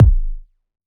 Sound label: a kick; hip hop beat battle; loosely rock themed